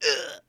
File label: Pain, Bad Vocals